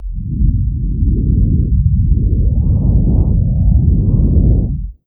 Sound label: deep warbling